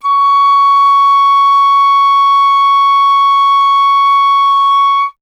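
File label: flute piccolo; Miroslav Vitous - Solo Instruments VOL-2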